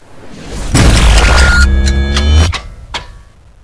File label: time stop